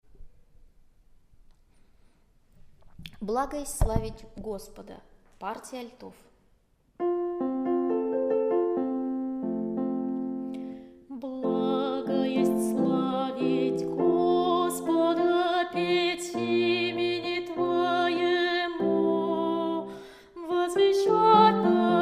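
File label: alto; Blago est slavit Gospoda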